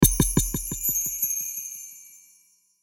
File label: Hit Stab Bass Chimes Delay; Blastwave